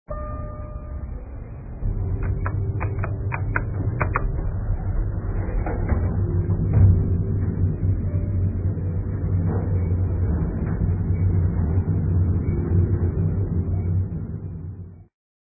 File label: Elevator